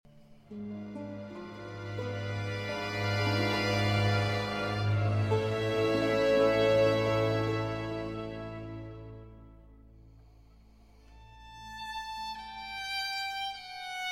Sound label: Concerto for Strings and Continuo in D minor